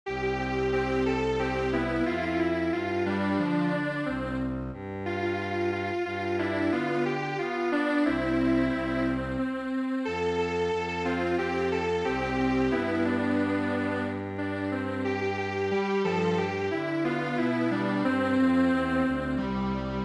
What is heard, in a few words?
Strings Quartet